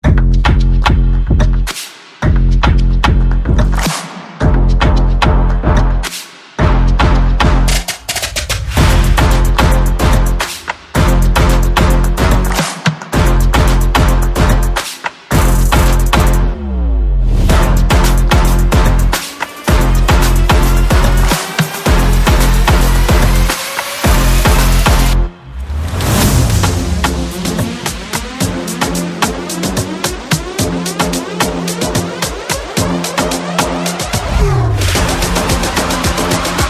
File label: Light Percussion